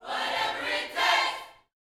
Vocal, Gospel, PHRASE; Spectrasonics

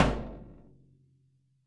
DAV ZWEIG F, sticks; SoundBytes - Early Patches

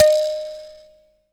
THAMB PIANO, AFRICA

